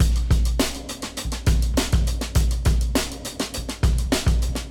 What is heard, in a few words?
Drums; simple session